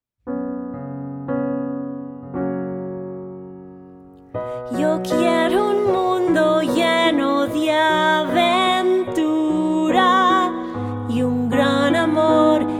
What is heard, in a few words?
Disney Songs Spanish Version